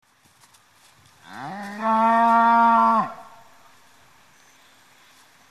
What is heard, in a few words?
cow